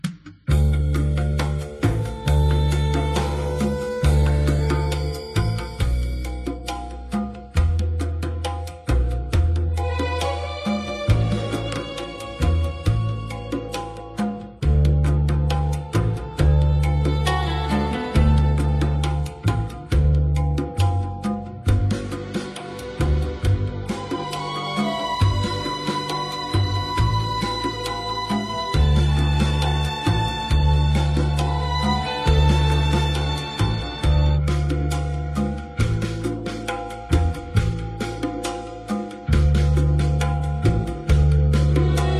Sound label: Instrumental